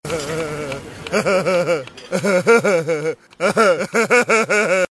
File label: best laugh